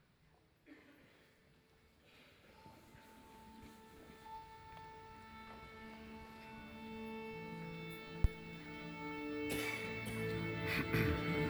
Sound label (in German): Instrumente stimmen; elphi first visit